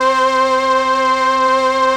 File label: STR C, STRING; Best Service ProSamples vol.55 - Retro Sampler; AKAI; BRASS+STRING